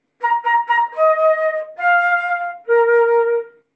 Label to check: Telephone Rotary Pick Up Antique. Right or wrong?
Wrong